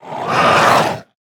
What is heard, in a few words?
Felhound Attack; Lakkari